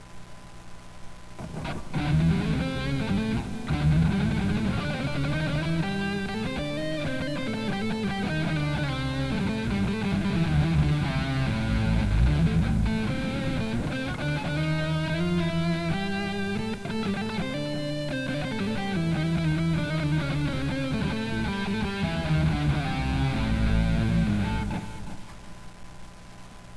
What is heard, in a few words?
octave tone